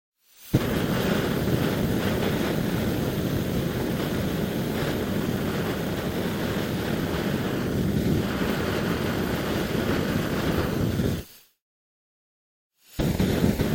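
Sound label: two long shots from flamethrower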